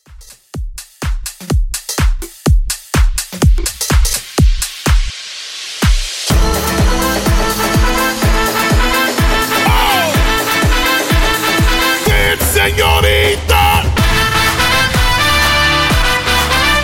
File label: Party People In Outro Mixshow 125Bpm